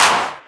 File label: Claps; Vengeance; Essential Clubsounds Vol.4